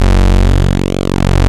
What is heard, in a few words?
PULSEMOD, ARP Synths